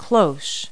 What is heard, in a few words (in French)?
Cloche; Multi-Lingual Talking Picture Dictionary